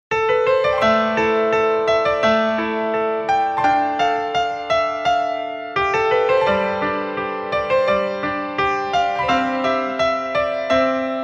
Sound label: Instrumental